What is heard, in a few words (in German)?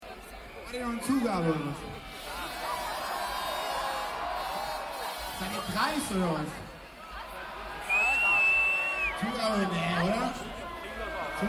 delay stimmung